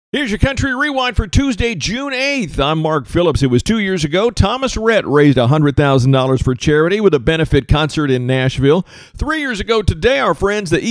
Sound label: country rewind